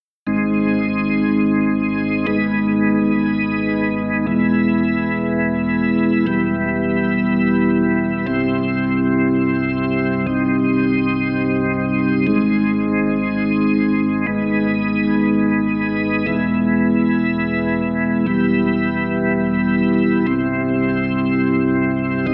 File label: hexatonic cycle ab e c